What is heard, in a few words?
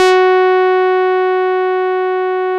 RAW SAW, BASSES; Masterbits - Vintage Voltage; VV BASSES